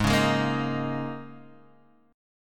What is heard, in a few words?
G sharp Suspended 2nd Suspended 4th, chord; x,x,x,1,1,3,0,0